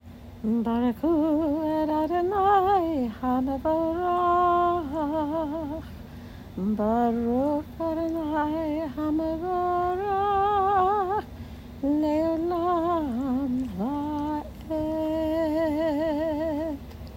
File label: Barchu, prayers